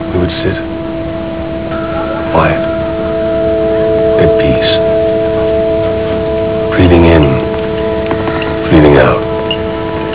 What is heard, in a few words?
breathng